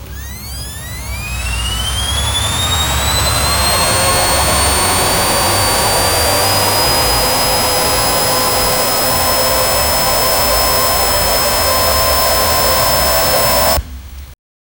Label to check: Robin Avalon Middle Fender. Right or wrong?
Wrong